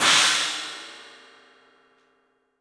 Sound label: CYMBALS; 3D Sound; 3D Drums